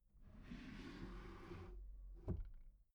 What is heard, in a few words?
desk drawer open soft